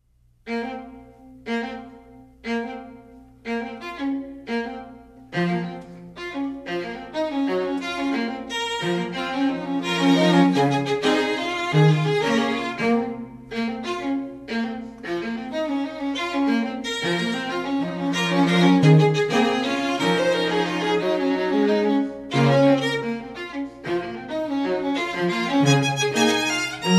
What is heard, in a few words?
Glass sample